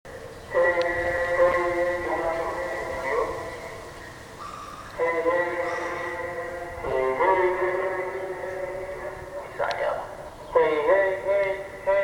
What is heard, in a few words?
mosque speaker repair